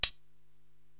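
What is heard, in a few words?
HH KPR 55 Close, hi hat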